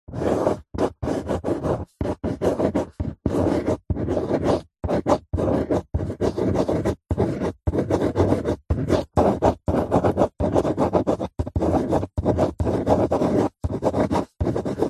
office handwriting pen on paper on cardboard box large letters medium; zapsplat